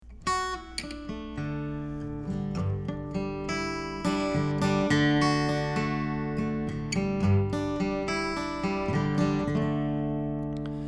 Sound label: Singing; Pearlman TM LE; VOCAL+OMPW: 1 MIC